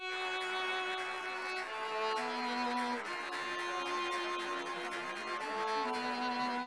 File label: Castanets Violin Applause